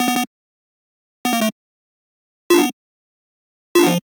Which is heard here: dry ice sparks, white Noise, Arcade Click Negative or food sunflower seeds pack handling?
Arcade Click Negative